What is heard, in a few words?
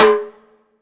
SR H TIMBALE, Drum Mashines, SR-16 DRUMS; EdgeSounds